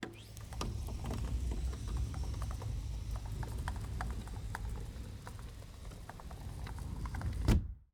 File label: Sliding door, track, heavy, glass, metal, dragging, course, gritty, closing, back, slow; Eiravaein Works; Vaeyan IV